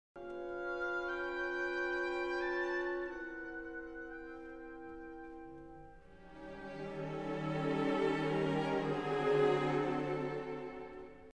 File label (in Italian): totale cromatico